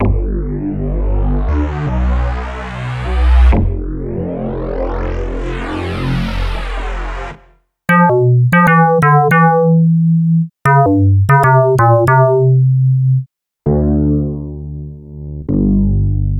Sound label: Synclavier Bass